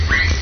eep, humpbacks haro strait; salish sea data examples; signals

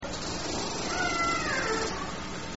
awwww uh hun, transient killer whales; salish sea

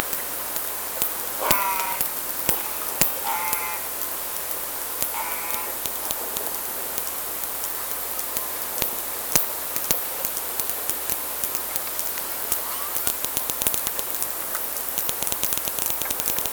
Clicks Calls

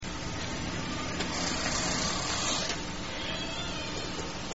groan burp, orca; dabob transient calls

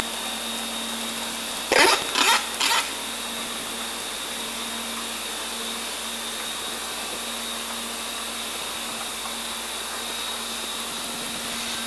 Clicks Calls

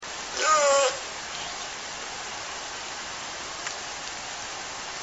S4 descending, orca call

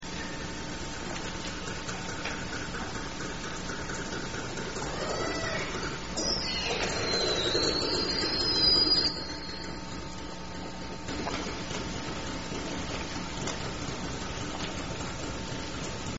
clicks 5kHz whistle, transient killer whales; salish sea

